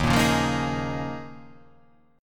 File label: D sharp 7th Suspended 2nd Suspended 4th